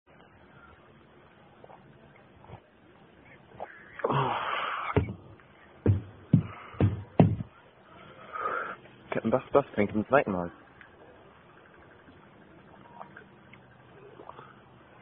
Captain Buff, German Version Of Are You A Cardinal Drinking Game